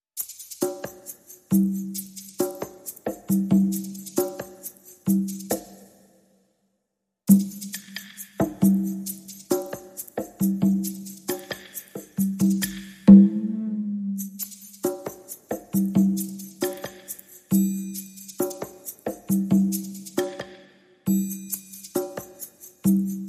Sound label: Perc Only